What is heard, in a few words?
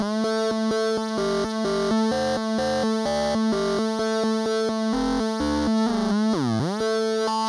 synth riff